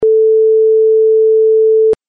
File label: Tone